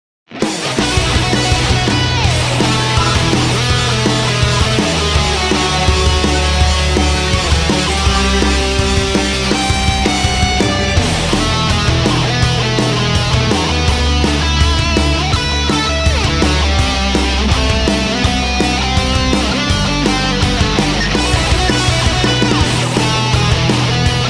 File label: Metal; Dragon Ballz